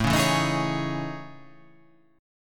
A Major Sharp 11th

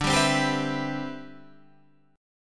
D 13th Suspended 4th D; D13sus4 strummed; JGuitar

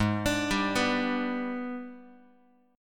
G sharp Minor Major 7th Flat 5th G sharp, Guitar; arpeggiated; JGuitar

